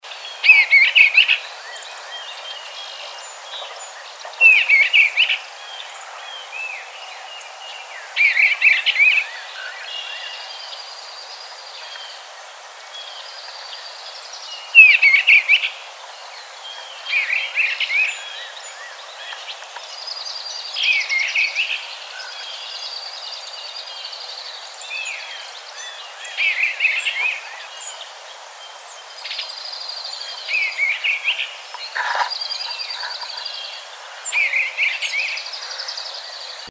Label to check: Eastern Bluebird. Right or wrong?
Right